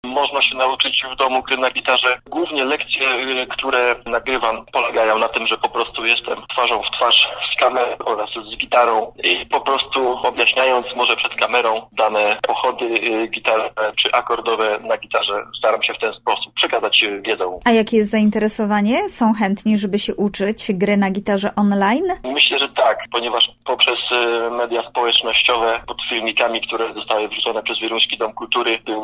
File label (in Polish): gitara; zajecia w wielunskim domu kultury online